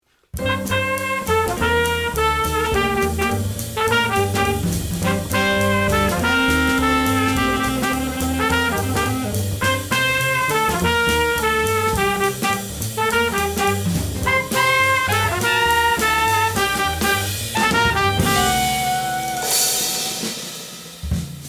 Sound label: alto short